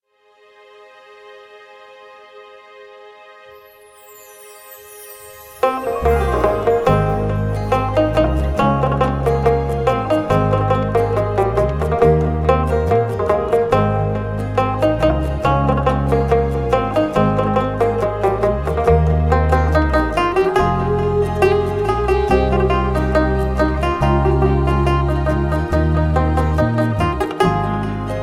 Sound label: Instrumental